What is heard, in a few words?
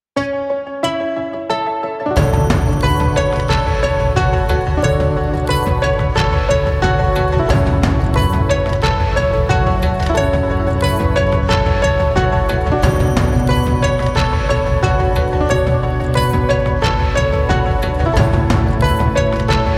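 ambient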